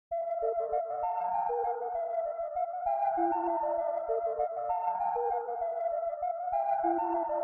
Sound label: 131 BPM D Min Pad; Loop Stems; Cymatics; 2022 Melody Collection Platinum Expansion - Pt 2; Triumph